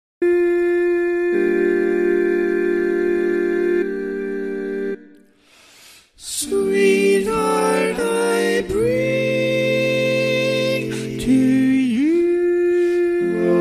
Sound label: Tenor, barbershop